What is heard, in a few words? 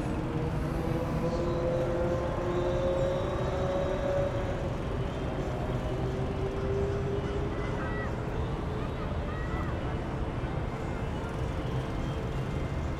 front clip; Zoom H2